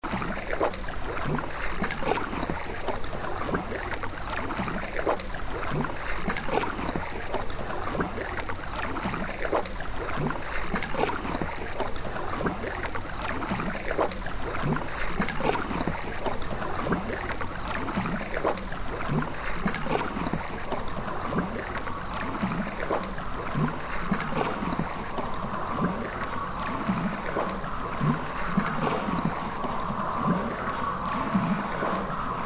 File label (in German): paddelgeraeusch komposition